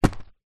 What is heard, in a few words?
household first aid kit pouch fabric drop carpet